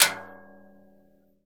CASCARA, Synclavier Percussion, World & Orchestral; ILIO; Synclavier Percussion 2